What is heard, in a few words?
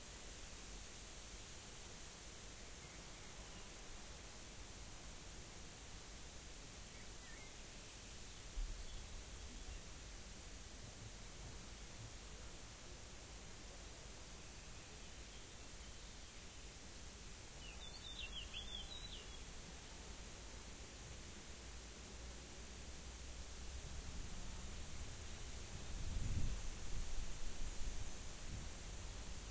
rayol farm; SEAMED